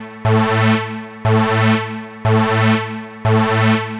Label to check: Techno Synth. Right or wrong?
Right